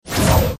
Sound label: rend effect